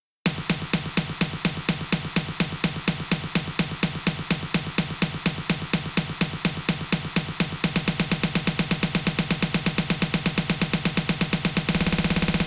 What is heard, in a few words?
Long Fill; Drastic Voyage